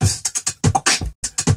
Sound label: bzzt, Beatbox, Drum loops